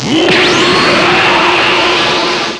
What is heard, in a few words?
special beam cannon